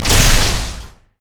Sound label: guardian spell attack; odin